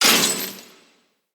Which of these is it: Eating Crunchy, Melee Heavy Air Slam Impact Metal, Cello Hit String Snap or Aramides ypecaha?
Melee Heavy Air Slam Impact Metal